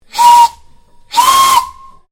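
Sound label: Train Steam Locomotive 0-2-0 Whistle; Kraus No5682; 1906; Vehicles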